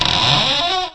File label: FX Coff; fun and bullets